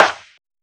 Clap

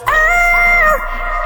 RRRRR, vox

